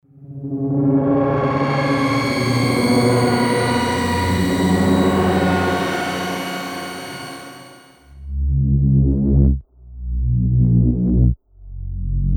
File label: Clockworks